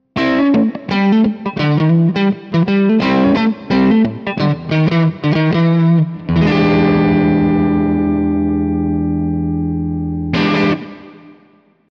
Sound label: Framus Diablo Pro Neck PU split crunch